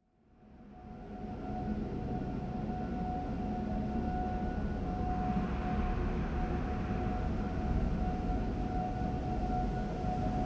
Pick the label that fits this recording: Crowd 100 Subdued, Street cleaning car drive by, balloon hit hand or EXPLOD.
Street cleaning car drive by